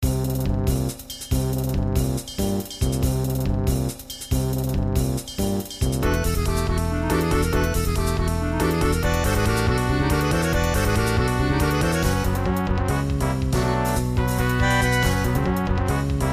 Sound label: bass, midi